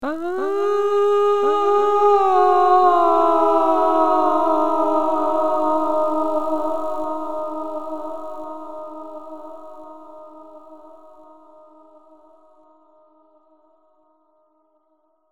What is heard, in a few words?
Vocal